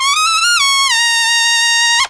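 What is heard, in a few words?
solo trumpet, Killer Brass Riffs; USB Soundscan vol.29 - Killer Brass Riffs; AKAI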